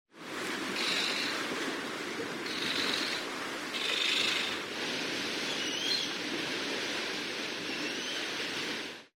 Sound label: hawaiian noddy, seabirds